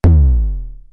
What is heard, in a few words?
BDrum, Drumfire DF-500